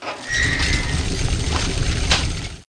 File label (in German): Backer; Anno1602